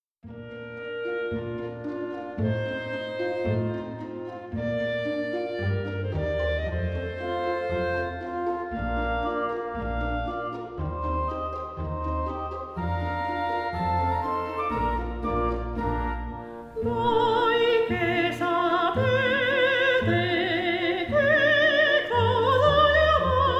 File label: opera; mozart